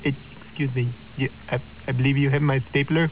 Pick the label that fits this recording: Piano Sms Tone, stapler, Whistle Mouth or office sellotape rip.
stapler